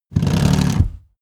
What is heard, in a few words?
car seat slide forward fast rattle